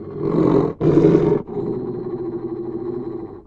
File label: antlion guard far lost; hl2